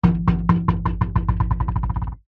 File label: sport handball bounce glass table recorded underneath